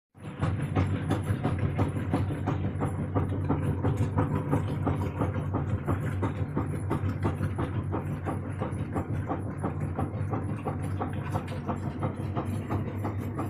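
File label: washing machine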